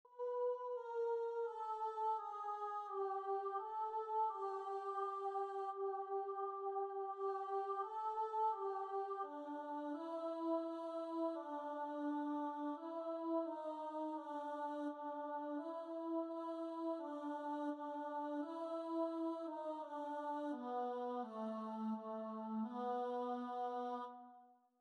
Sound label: choir